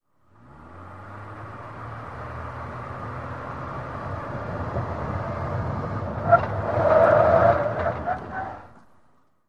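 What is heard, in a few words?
SUV Truck 180 Rev Skid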